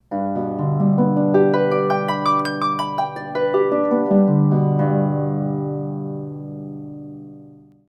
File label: Arpeggio, Harp